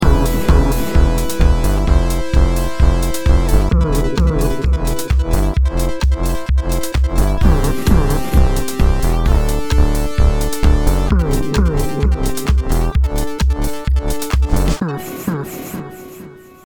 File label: VolumeShaper Custom 3band Chain